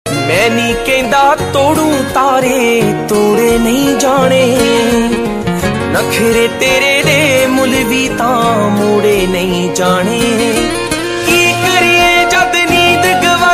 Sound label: Punjabi Song Status Ringtone